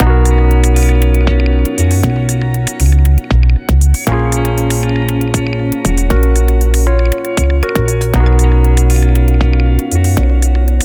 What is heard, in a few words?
tiny concert, alarms; Sony Xperia A SO 04E